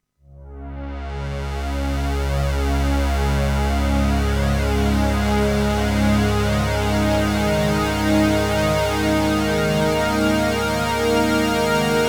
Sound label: pads